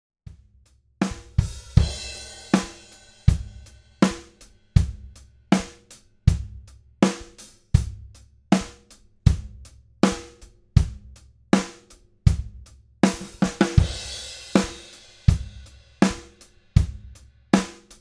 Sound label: black beauty im set, snares; ludwig black beauty